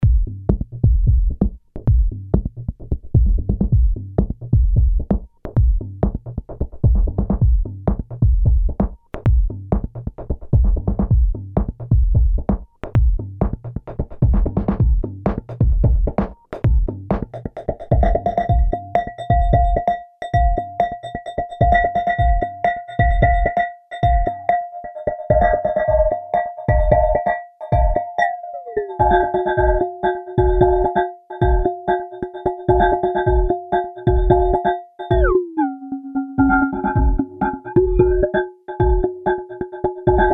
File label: multiple resonators (3 instances)